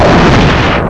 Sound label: explosion short; Spheres 1.00